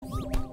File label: celeste sound collectible touch